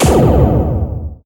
aircraft shot fire; Original TK Sounds

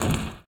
roof step; Golden Crayon Sounds

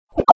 Bubble Disappear; ESAN VO Sound Schemes; ESAN VO Sounds Pack

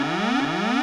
alarm; Golden Crayon Sounds

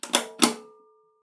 light pull; Golden Crayon Sounds

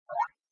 Folder Opening; ESAN VO Sound Schemes; 8 Bit Sound Pack V2

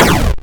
8bit fall; Golden Crayon Sounds

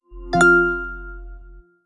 iPhone Boot Chimes Chime

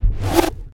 Quick Reverse; Timecrest Music

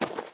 gravel step; Golden Crayon Sounds

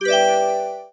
Scroll Page; business scheme beta 2

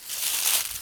leaves step; Golden Crayon Sounds

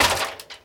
ladder step; Golden Crayon Sounds

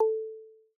Level Increase; iOS Sounds

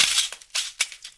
broken glass step; Golden Crayon Sounds

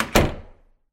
door close; Original TK Sounds

